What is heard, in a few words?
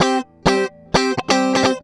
GUITAR LICK; Ueberschall; Jam Box